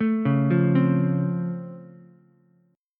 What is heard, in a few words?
C sharp Augmented Major 7th C sharp down Ukulele, chord; C#+M7; arpeggiated; JGuitar